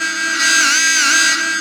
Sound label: AIR RAID, INTRUDERS; Spectrasonic Distorted Reality 1